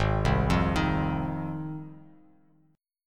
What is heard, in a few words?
Ab 6th down Bass Standard; arpeggiated; JGuitar